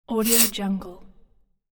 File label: smooth metal page proceed slide